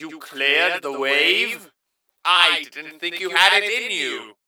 MvM Wave Complete; gray mann

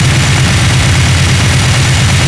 giant heavy backup gunfire

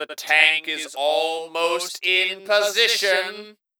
tank alert; Gray Mann MvM

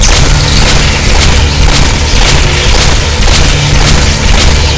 fire crit; tokamak

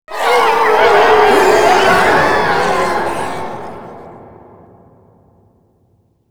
mega mob incoming; surrounded; infected

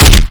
shotgun burst fire, weapons

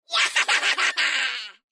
imp thrown; mvm forever

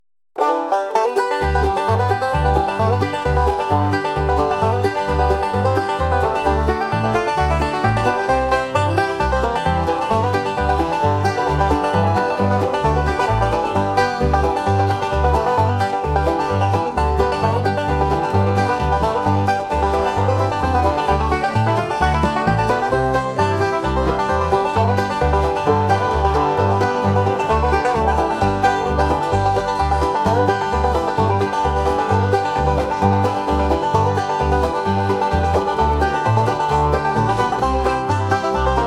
banjo

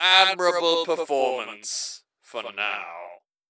MvM Wave Complete; Gray Mann

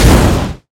sweeper explode

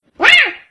raar

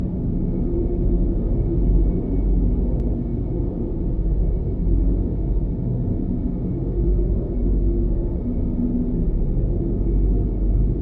ship ambient loop; maykr ship

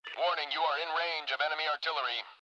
missile

